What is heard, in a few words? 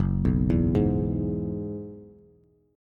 F sharp 7th Suspended 2nd Suspended 4th F sharp down Bass; F#7sus2sus4 arpeggiated